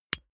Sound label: lighter electric switch